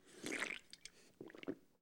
beer; easter egg; netent

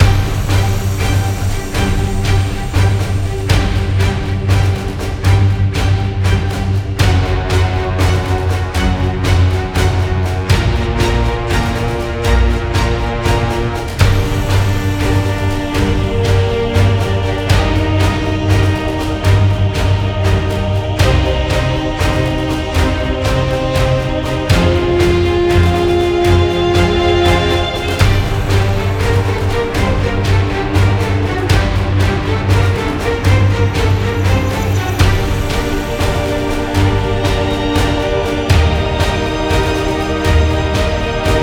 music free spin; netent

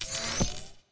win flash; netent

